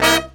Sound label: BRASSAMPLE; E-MU Formula 4000 Series Vol. 5; Protozoa; Phatt Instrmt